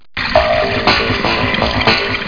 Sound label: banshee